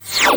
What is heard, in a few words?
DOPPLE WHIZ; Distored Reality